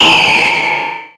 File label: pokemon cry